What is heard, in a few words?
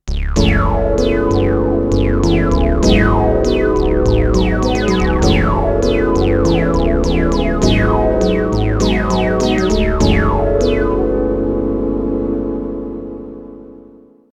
Mellow Chords